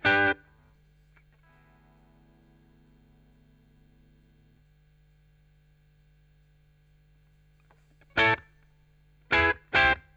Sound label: Chorus Gtr